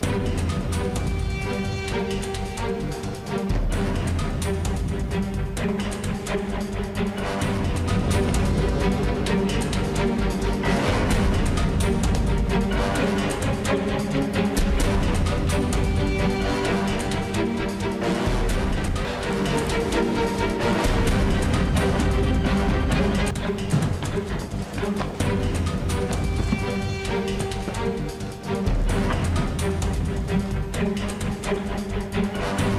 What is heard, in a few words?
Action Music